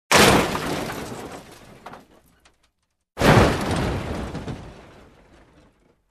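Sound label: car crash, collision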